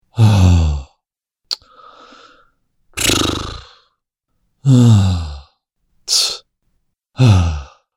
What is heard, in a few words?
Male Annoyed And Fed Up Sounds; Recorded Voice Sound Effects